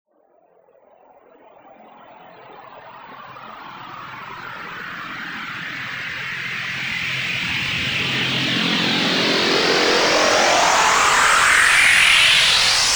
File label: Riser Drone (E); Riddim; One-Shots; SFX; Ghosthack; Riddim Essentials Volume 2